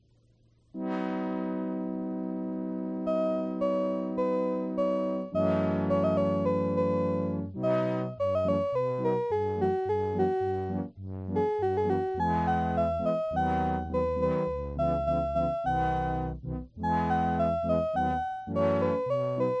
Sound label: Gypsy tune sans gypsy, Casio PT20, P600